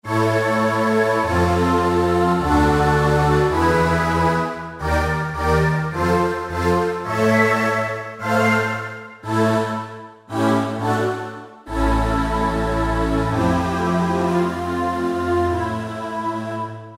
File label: Ahh Choir; Factory Preset examples